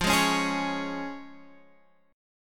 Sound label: F Minor Sharp 5th, Guitar, Drop D